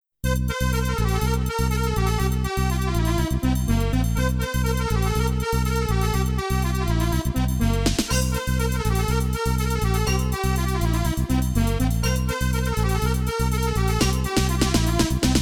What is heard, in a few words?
jogging happy 432 hz